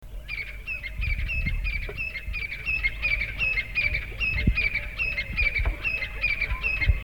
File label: dendroperdix sephaena, birds calls; phasianidae